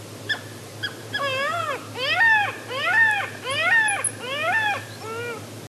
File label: parasitic jaeger